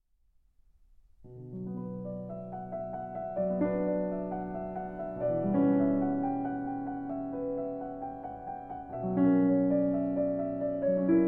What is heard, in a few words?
Prelude in B Minor; BWV 855a; Transcribed by Alexander Siloti